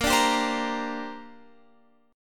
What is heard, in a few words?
Bb 6th Add 9th Bb Guitar Open F; Bb6add9 strummed; x,17,14,14,15,17